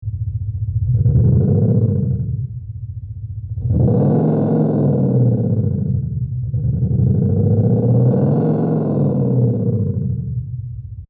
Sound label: panthera v8